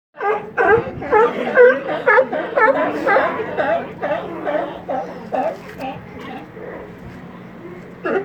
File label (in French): Lions de mer; Monterey; californie; oregon